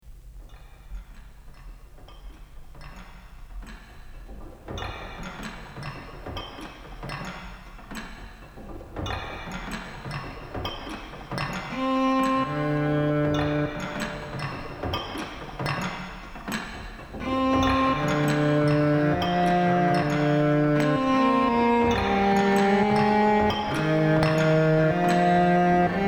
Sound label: Last Chords